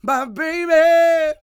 SING W, Vocal Planet Blues & Soul, BLUESY; ILIO

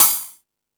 Percussion FX, E-MU Pro-Cussion

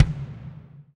BD, BD REAL

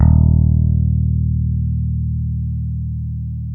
P BASS D#2, pick bass

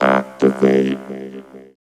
VOCOD, Tekno Industrial; East West

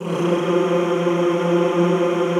F2, Classical Choir; Best Service; Extended Classical Choir

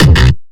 bass kick

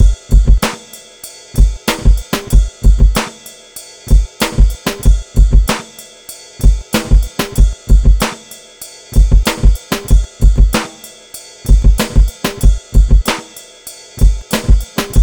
Drum Kit, Electro Lounge; Sonic Foundry (Sony Creative Software); 095 Get It Done

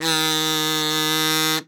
KAZOF E3, KAZOO; Xsample VOL-9

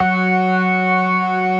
STOPS, Synth and Organ

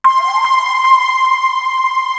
BELLS; East West - Global Sounds

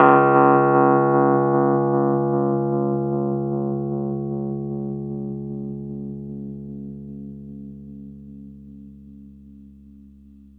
Rhodes Db; 3D Sound; 3D Keys VOL-1

